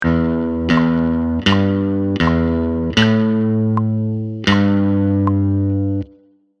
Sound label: riff 80bpm, guitarra; 3 riffs faceis para comecar